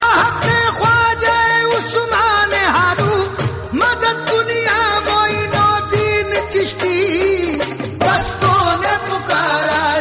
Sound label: qawwali ringtone